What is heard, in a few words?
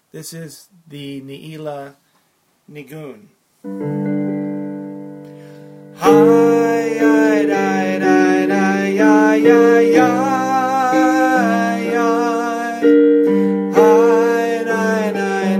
neilah niggun